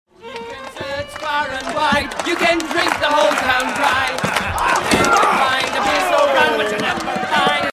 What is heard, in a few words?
drinking; edoras